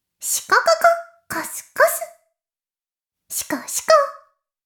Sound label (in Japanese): 妹 しこしこ音声